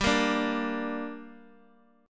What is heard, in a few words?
G Minor G, Guitar Open D; chordshape; down